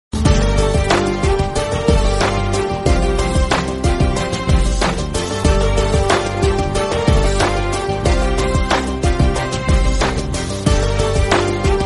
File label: bgm ringtone; darling movie